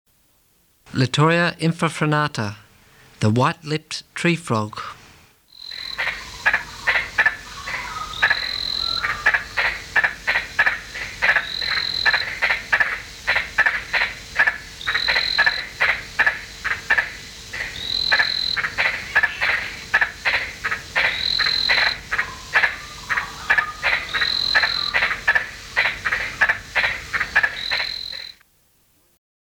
Litoria infrafrenata

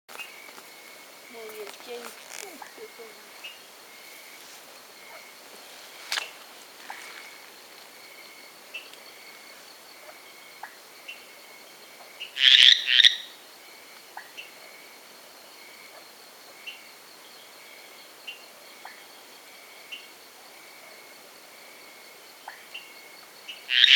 Dendropsophus stingi

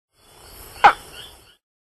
Platypelis grandis

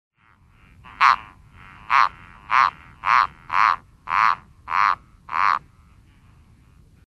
Mantidactylus alutus

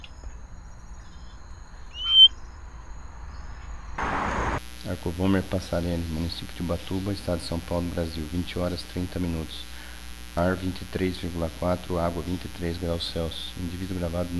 Arcovomer passarellii

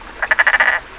Bokermannohyla hylax

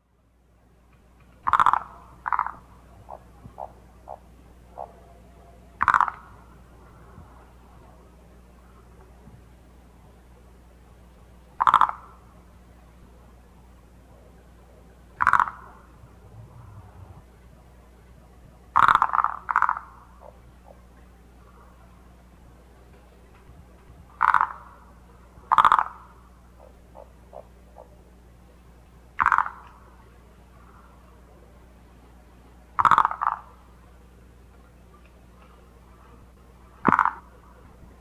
Phyllomedusa tetraploidea

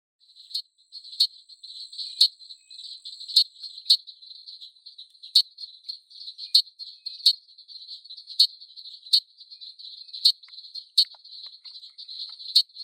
Dendropsophus nanus

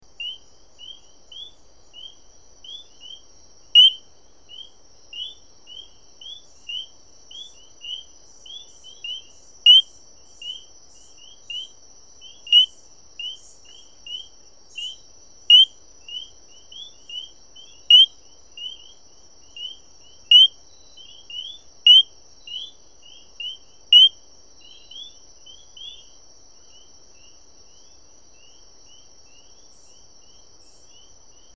Diasporus citrinobapheus

